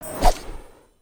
Summary Whoosh; aronwk collection; ndaudio

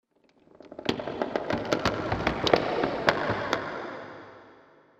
Gate Chamber Earth; ninjago

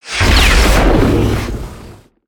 Spinjitsu Earth Tornado Start

